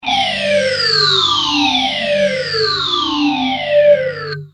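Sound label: guitar boss ph3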